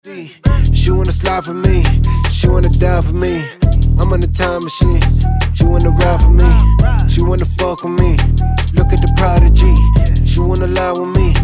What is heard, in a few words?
HIP HOP